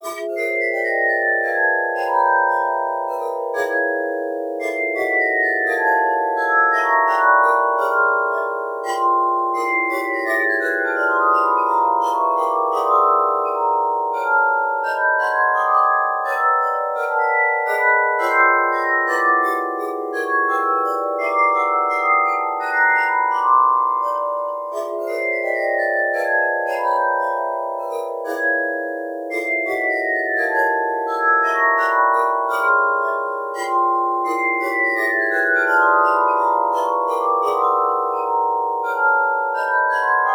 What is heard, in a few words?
Bowed Gamelan; Sagittarius; Soniccouture